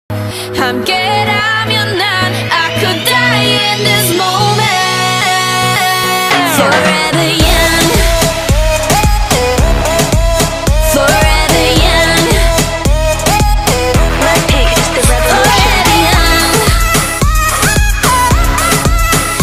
korean ringtone

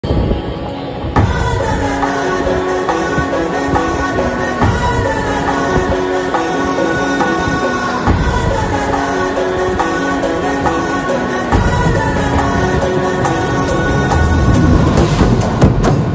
BGM ringtone

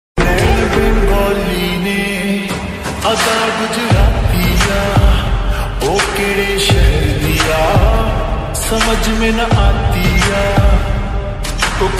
slowed punjabi ringtone

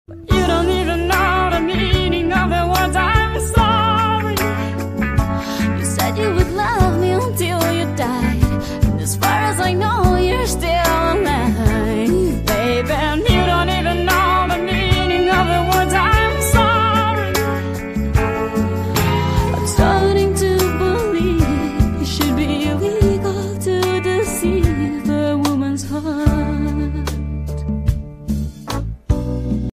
english ringtone

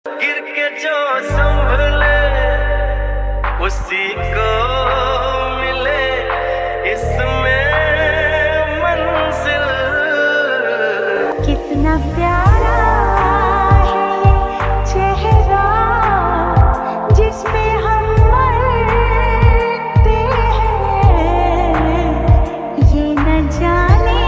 lofi hindi ringtone

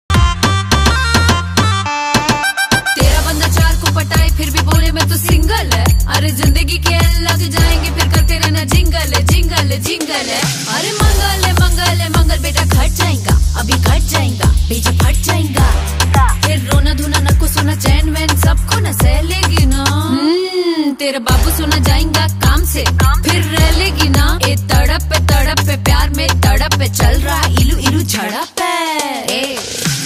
bhojpuri ringtone

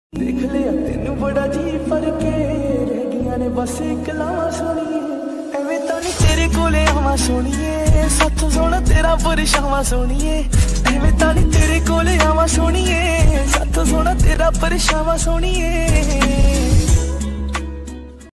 lofi punjabi ringtone

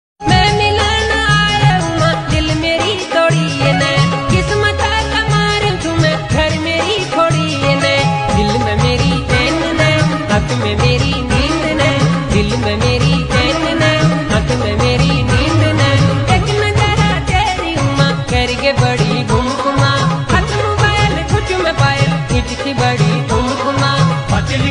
uttrakhandi ringtone

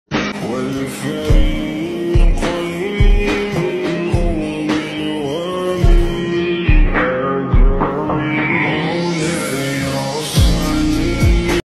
lofi remix ringtone

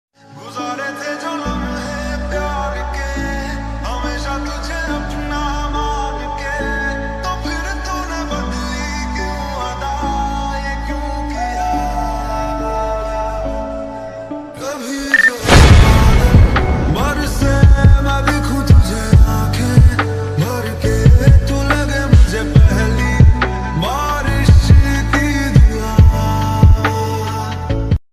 lofi hindi ringtone

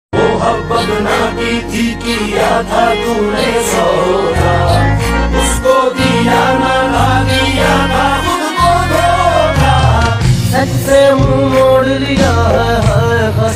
Pakistani ringtone

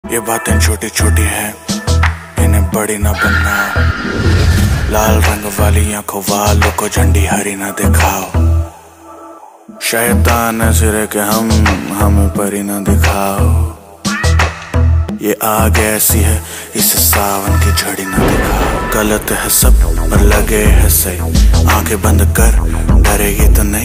hindi rap ringtone

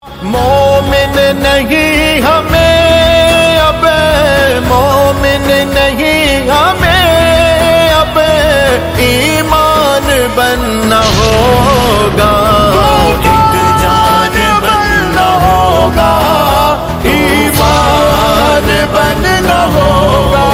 islamic ringtone

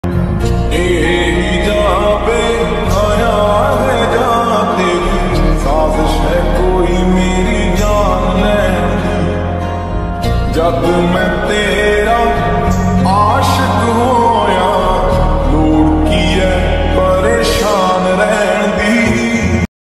lofi punjabi ringtone

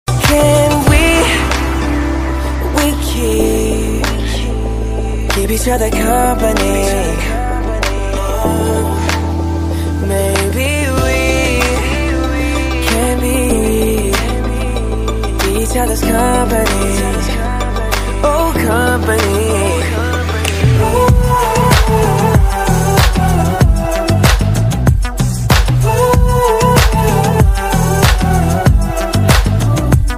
english ringtone

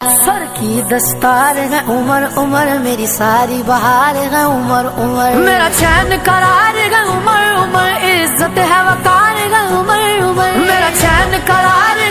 islamic ringtone

